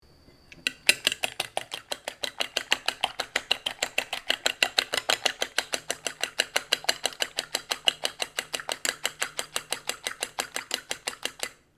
kitchen sounds chef whisking an egg using a fork in glass bowl